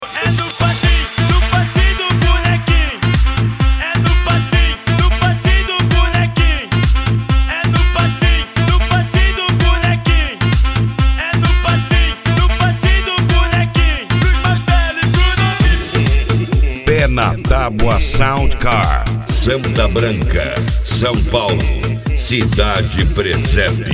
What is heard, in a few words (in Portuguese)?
sound car carnaval